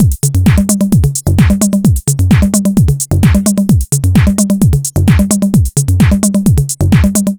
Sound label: 130 BPM, Digital Breaks; loops; Loopmasters; Jon Carter & Alex Blanco; Block Party Essentials